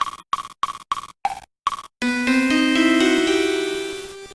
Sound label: Jingle Success; Super Mario Ball - Level 2 Success